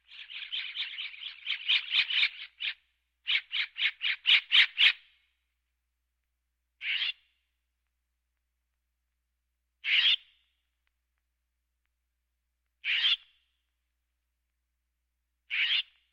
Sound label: Birds